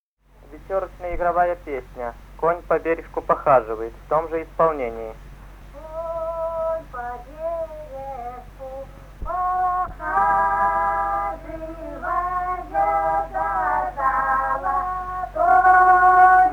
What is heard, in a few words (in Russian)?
Конь по бережку похаживаёт, Маковское